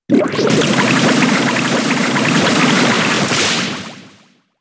bubble beam

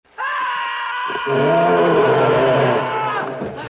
screaming; marty mcflys

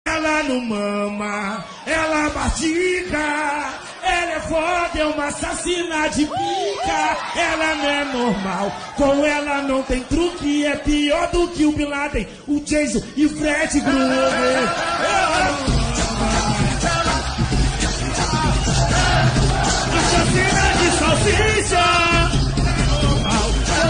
funk

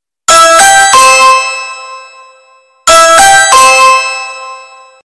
ring doorbell extremly loud

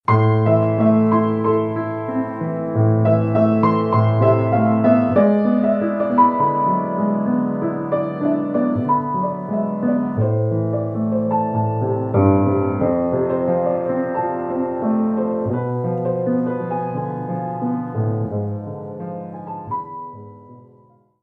piano

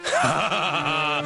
short laugh

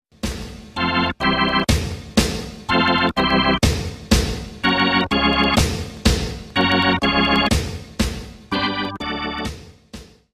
piano

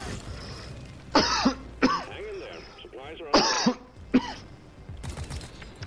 dry cough

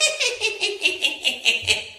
hihihi

